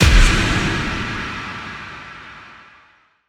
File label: continue Button; netent